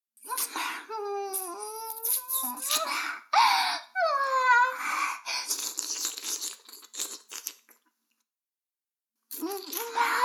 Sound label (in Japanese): 耳舐め音声(LR逆ver); 逆時間停止されて